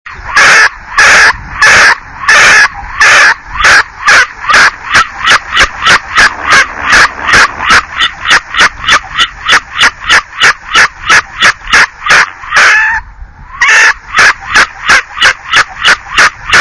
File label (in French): mouette